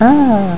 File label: ooh; PARASOL; BEACH